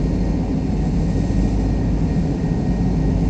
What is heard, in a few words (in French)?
Faucon interieur; vaisseaux